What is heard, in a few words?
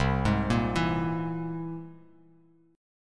C Augmented 7th; arpeggiated; JGuitar